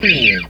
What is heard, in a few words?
GTR SQUEE, Funk Gtr & Wah; PlatinumPhattCD2; E-MU Producer Series Vol. 8 – Platinum Phatt